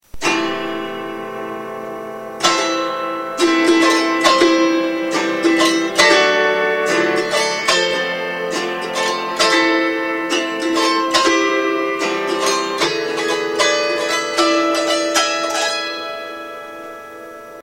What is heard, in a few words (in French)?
autoharpe; instruments